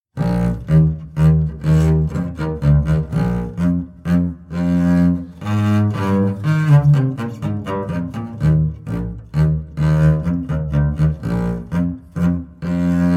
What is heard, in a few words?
acoustic double bass classical sequence